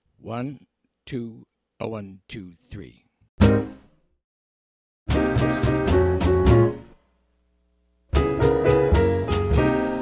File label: minus Melody